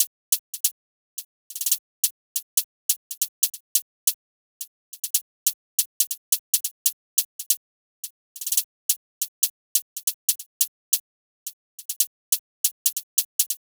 Hihat Loop 140 BPM; Birmingham

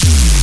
Bass Hit D#, Dubstep; Radiance Bass; Ghosthack; AC2024 - Day 13 - Dubstep Sounds 2025

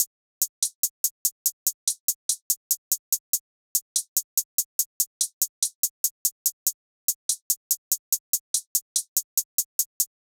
Drum Loop 144 BPM Hihat, Trap; Cymatics; ANALOG EVOLUTION Beta

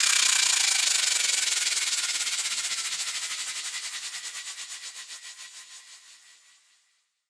Tension White Noise Down - 140 BPM; FX; Cymatics; EDM Starter Pack

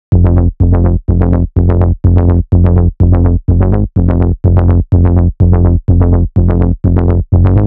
Bassline 125 F; Lifier

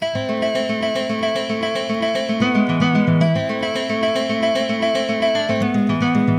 Various Melody Loop - 150 BPM A Min Guitar; Melodies; Loop Stems; Cymatics; Oracle Sample Pack; Oracle Various Melody Loop 16

